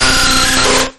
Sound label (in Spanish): Grito de Zubat